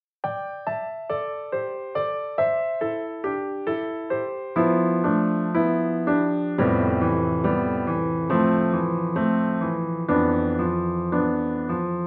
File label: American silky